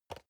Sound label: storage place table soft, box; objekte